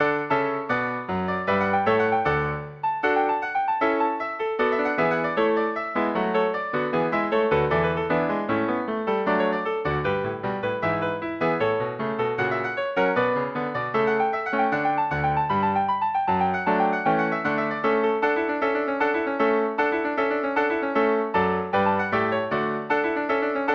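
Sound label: March in D major; Corelli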